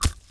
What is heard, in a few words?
grass; Mafie 1